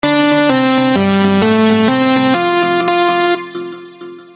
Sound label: Samsung SMS tune